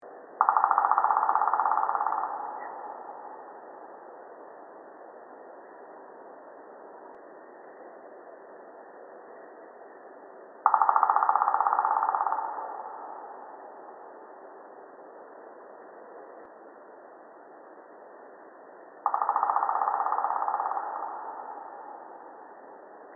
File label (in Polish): dzieciol czarny bebnienie